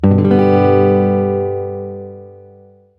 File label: g major open, guitar chord